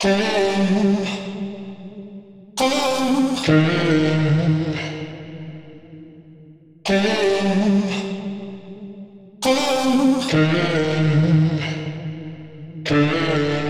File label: Pad Like vocal chop, 140, Gm; Slate Digital Omnivox Vocal Sample Pack; Chops